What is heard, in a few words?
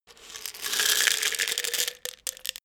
pills pouring; berufe; arzt